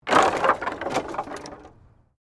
Wood planks debris; Materials

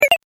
Platform Game Beeping Sound; Video Game Sound Effects; electronic sound effect; beeps, bleeps, blips

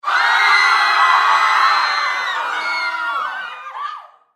Human Crowd Studio; Crowd Reactions Scream Loud; Blastwave

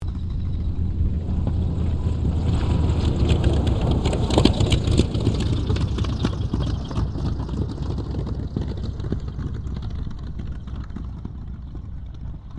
AIRCRAFT T-28D TROJAN LAND; Airplanes; Sound Effects:Vehicles

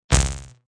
Squiggle; Squiggles

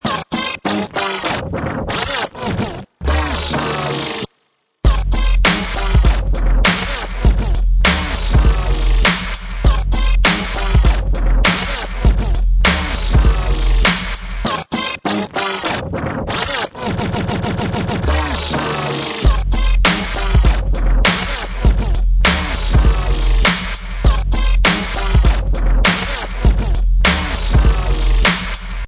DJ Scratch Hits; Ringtone

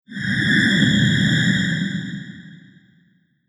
Mars Space Vacuum, Science Fiction Sound Effects

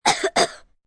Cough Short Dry, Cough, 1 child, dry & short x 2; human voice, kid; Sick; Voice Box

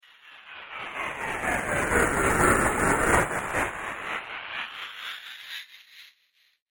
Magical Spin Back To Front, fantasy sound effect

